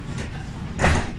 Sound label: door Close